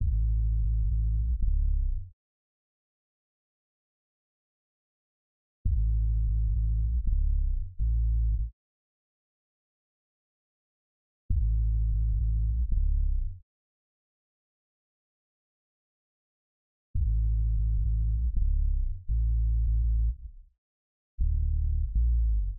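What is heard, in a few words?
Boombox Bass 85bpm D minor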